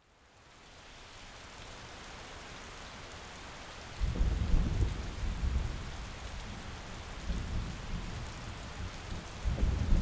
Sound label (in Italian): Mentre piove piano e voce rain